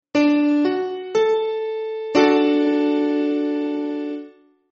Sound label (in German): D dur, konzertina; kunstklang